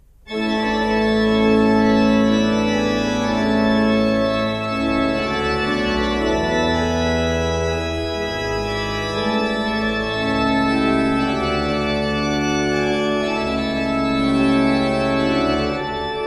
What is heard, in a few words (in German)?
Breil, gebrauchtorgel